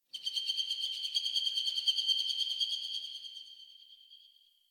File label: Tree Distant Critters Scared, Ambience; Warframe; Duviri